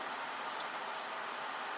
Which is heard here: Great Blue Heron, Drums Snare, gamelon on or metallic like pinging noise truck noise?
metallic like pinging noise truck noise